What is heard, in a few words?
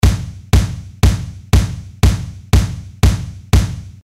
kick